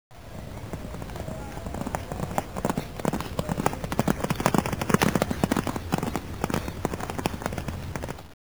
ride; colonial

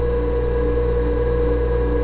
refrigerator

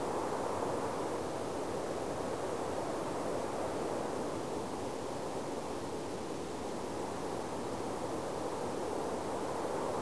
ambi

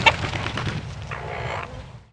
kill Chicken

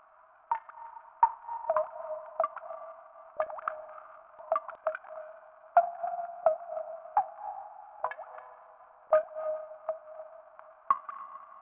Drip water

